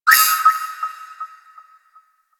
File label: Landscape Antenna Beacon Tower Blip; ambience; props; one offs; Warframe; Venus Open World